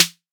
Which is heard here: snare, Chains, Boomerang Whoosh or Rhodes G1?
snare